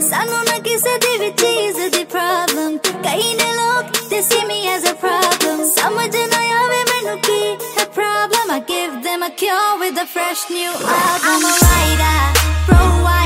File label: Female Version Song Ringtone